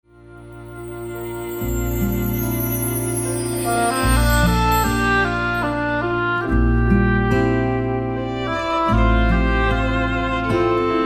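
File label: pillow soft, blanket warm instrumental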